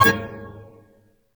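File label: synth hit; Greytsounds; F2 TRAX 3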